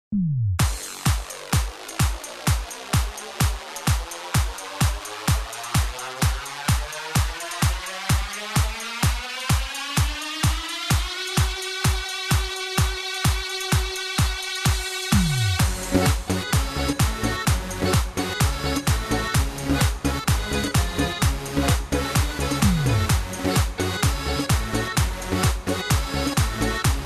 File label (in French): ducasse instrumental